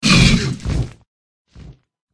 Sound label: dragonkin wound